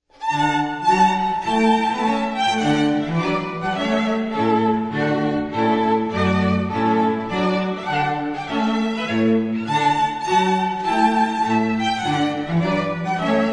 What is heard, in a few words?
Trumpet Tune